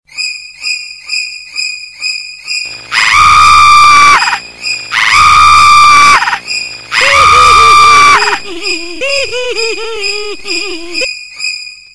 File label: suspense